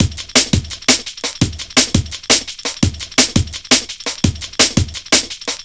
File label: Break, Jungle Breaks; Blu Mar Ten - Jungle Jungle - 1989 to 1999 Samplepack